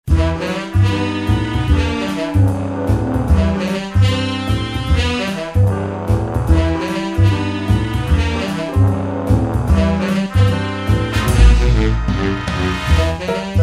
jazz

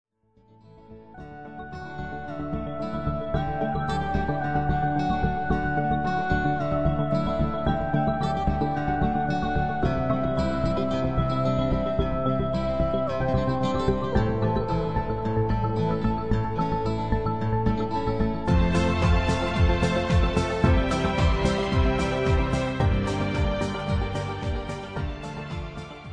industrial combinator; tinellimusica

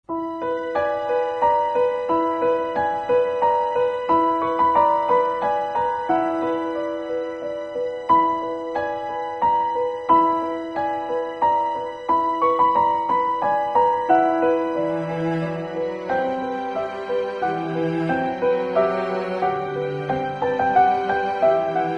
orch

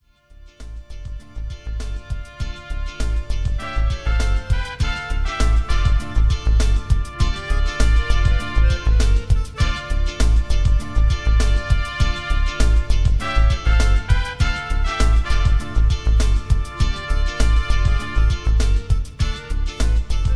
phazed funk

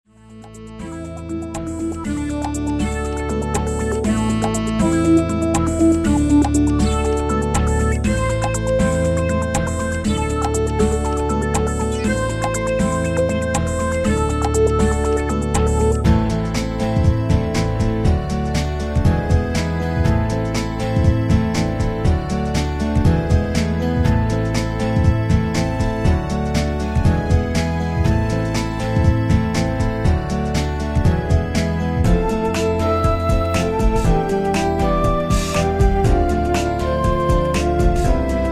flute

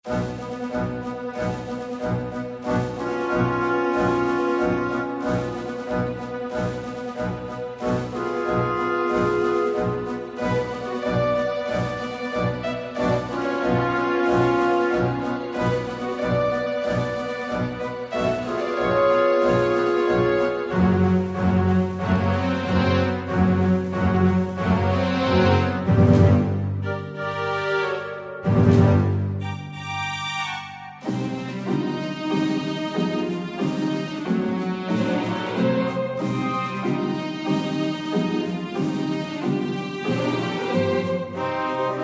orch

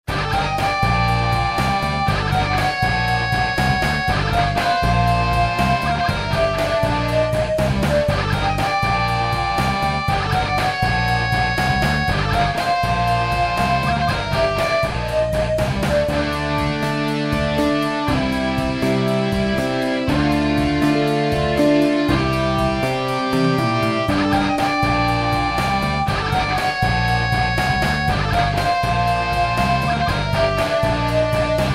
rock

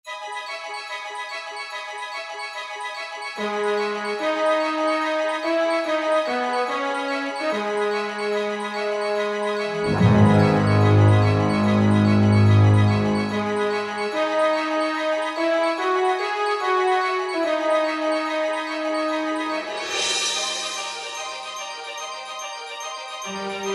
orch

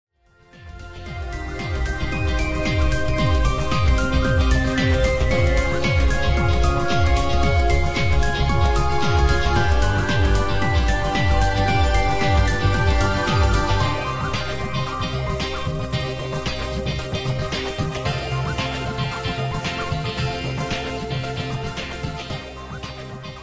industrial antique; tinellimusica

